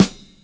full snare sound g key, Royality free steel snare drum tuned to the G note. Loudest frequency: 3133Hz